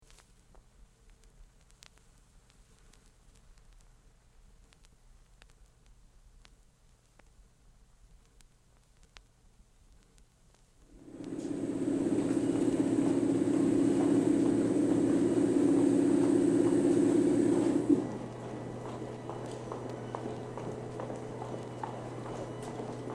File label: Train at platform, departs